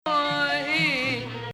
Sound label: Sikah Beladi